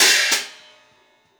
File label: Hi Hat Open; Essential Percussion